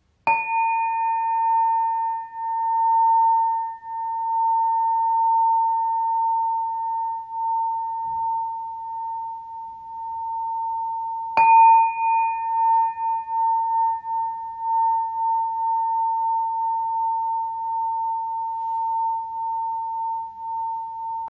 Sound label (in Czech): Zvonecek; mísy - zvuky